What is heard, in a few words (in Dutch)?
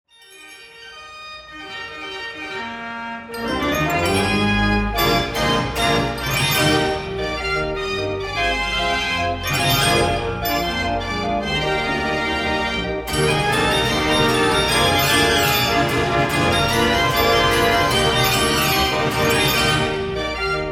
draaiorgel; orgelball bei barth